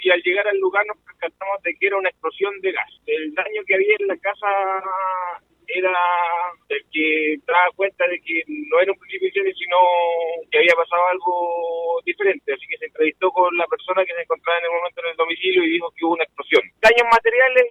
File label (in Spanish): explosión gas